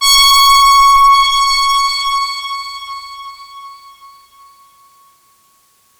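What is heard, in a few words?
big brass C#5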